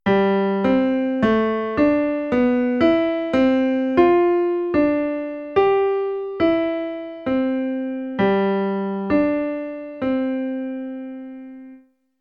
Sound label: piano lesson